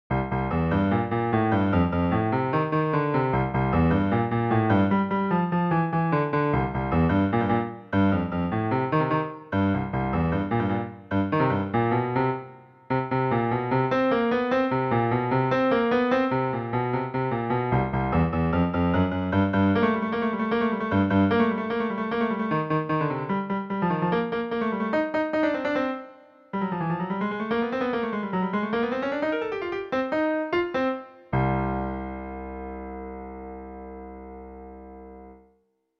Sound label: Piano Music; Maniac Mansion